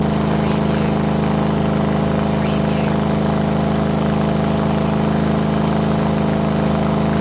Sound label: Chevy V8 Hot Rod Engine Loop